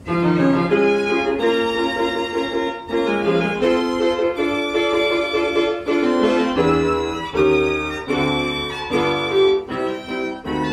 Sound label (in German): Phonoliszt Violina